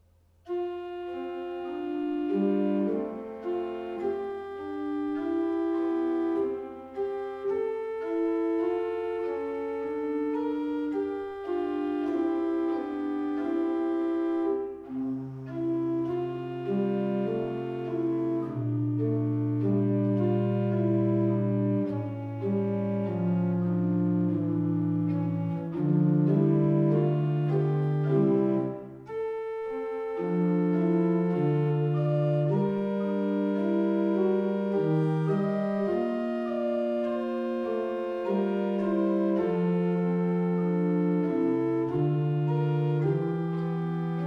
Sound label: Preludium in F, orgel; Pesse; Drenthe; Micheelsen